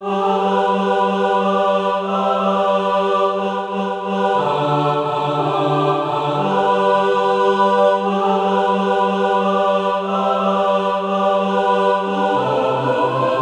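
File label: mixed, Practice Music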